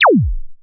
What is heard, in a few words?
laser; lasers